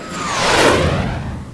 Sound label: plane overhead; Army Men TiS